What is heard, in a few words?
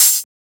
Open Hat; FLPRO